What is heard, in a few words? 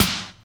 good snare sound g sharp key; snare drum one shots